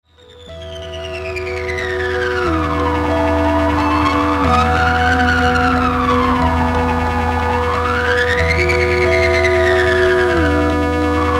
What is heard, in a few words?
Theremin; atelier Theremin